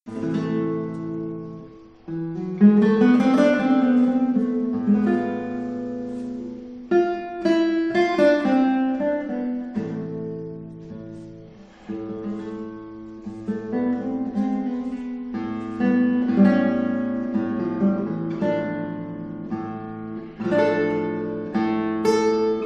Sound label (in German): Präludium A Moll für Gitarre Solo; J. S. Bach